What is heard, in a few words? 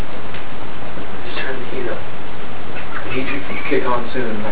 wheezy giggle